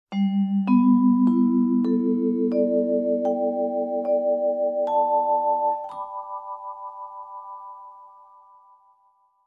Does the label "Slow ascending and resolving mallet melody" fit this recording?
Yes